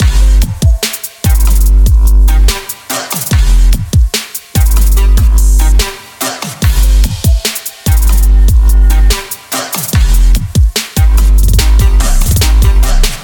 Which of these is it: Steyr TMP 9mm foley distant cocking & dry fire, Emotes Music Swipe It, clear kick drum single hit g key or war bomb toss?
Emotes Music Swipe It